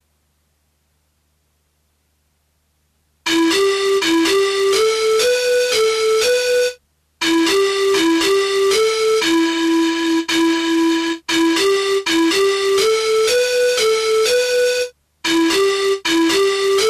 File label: Rainforrest Melody